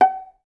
VAS PIZ F#4, Orchestra; Best Service; Peter Siedlaczek's Advanced Orchestra

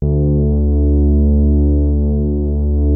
OSCAR D2, Rare Synthesizer; Hollywood Edge - Giorgio Moroder Rare Synthesizer Collection

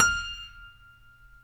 StereoGrand, Keyboards

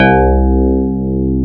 PIA DX E-PIANOS

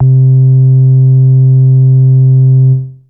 Bass Synths hits and octaves

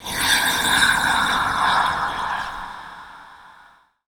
VOICE FX, Dance Vocals

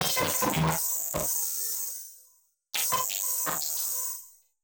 Malfo Syn, malfunctioning synth

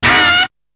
Hit; ZERO G - Datafile DISC 3

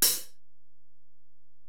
HiHat, Alesis DM-PRO

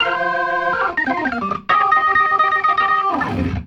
Riff; InVision Interactive; Keith Emerson; The Most Dangerous Synth and Organ; ORGAN RIFFS

